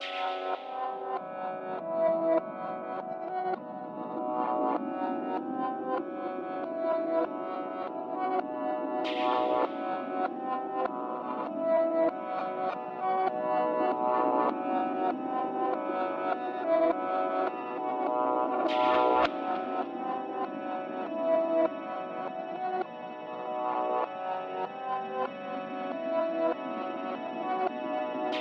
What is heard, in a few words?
Japanse Sci fi Retry game